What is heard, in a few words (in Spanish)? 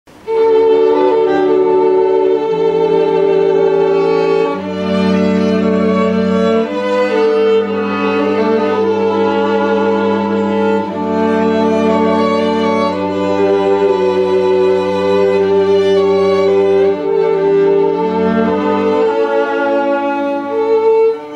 oboe cuerdas